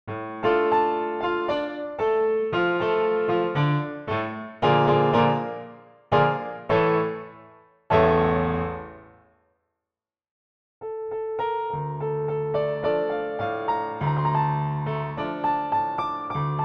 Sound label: pianoteq erard, piano; Villoldo; El Choclo